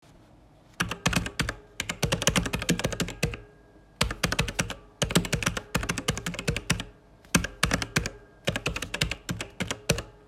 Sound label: Typewriter